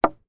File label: impact wood pole hit wood